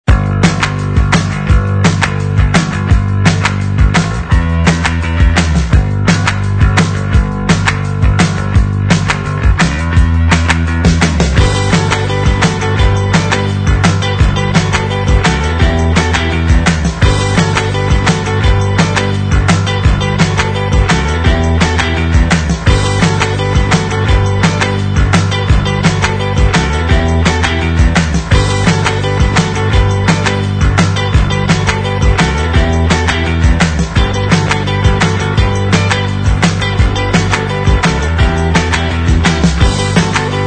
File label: Upbeat